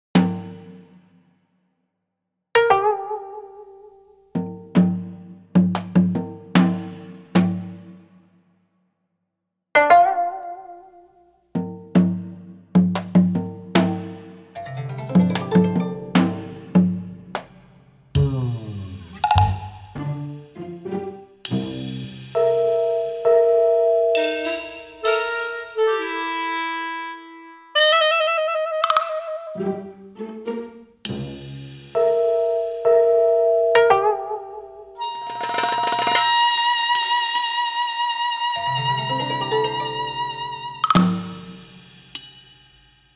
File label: Wood, Ethnic